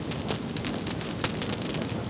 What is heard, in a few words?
FIRE LOOP, LAVA